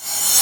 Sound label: CLUB, REVERSED; Wizoo - Platinum 24 Electronic